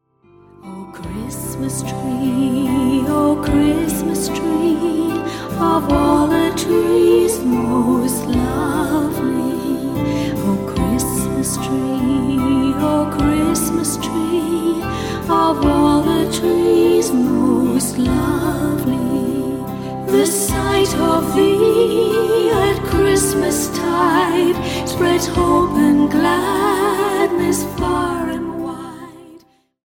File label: O Christmas Tree; merry christmas